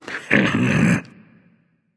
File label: heh heh, voice; Smite; ymir skin14